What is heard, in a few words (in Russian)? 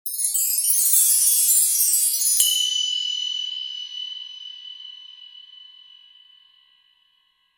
volshebstvo s akcentom v seredine